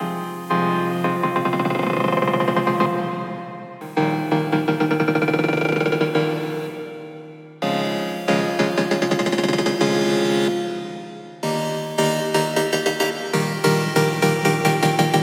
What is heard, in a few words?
Serum LD Break